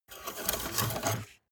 car bmw e46 exterior oil rod pull out

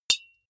deodorant hit, metal; soundpool

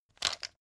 pepper mill; soundpool

